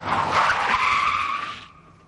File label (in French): frein; deadmaze; cinematique